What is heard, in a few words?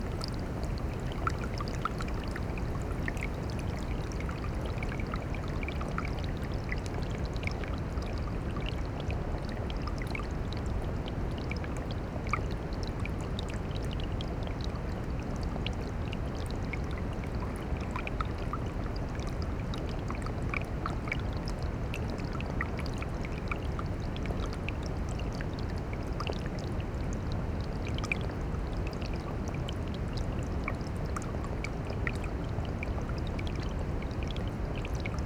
Gentle Water Flow; WATR Flow